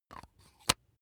razor battery compartment close hard

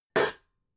pots hit clank

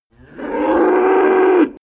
cow moo single distant

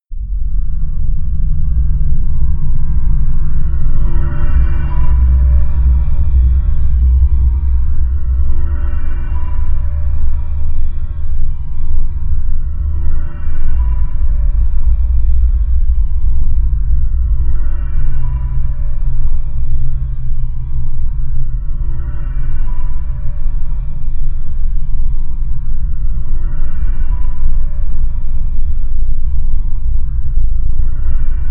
drone panic

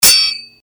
sword hit

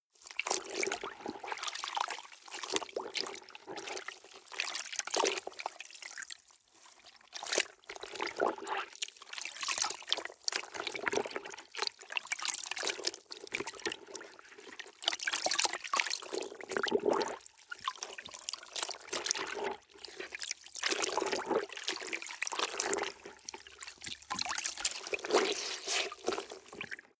clothes water wring sequence

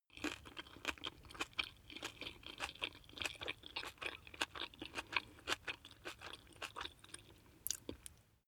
chew spaghetti medium

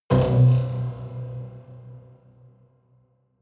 haunted strings buzz

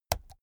keyboard laptop key press slow hard

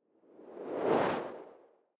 whoosh simple flutter

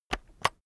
keyboard external enter press slow heavy

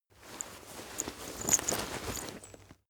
leather metal handling long

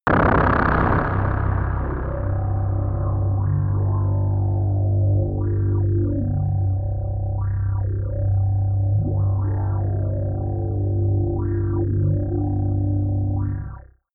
lightning wobble

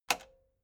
metal button spring click fast

